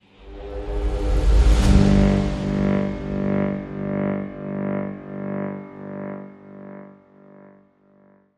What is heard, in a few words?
Link Sting